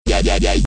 Dubwub

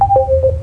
Door Chime; Nx-01

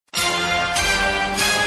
Msg Tone; Kbc

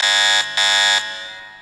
Alarm

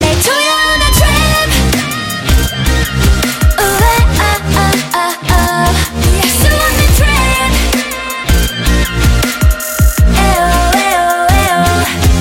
Trap Chorus

